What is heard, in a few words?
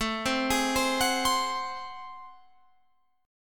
A Minor Major 13th A, Guitar, Open F; arpeggiated; AmM13; 21,19,20,19,21,19; JGuitar